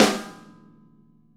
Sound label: VINT KITS; Sonic Reality - Vintage Timetraveler